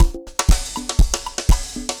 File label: dancefloor perc 120 bpm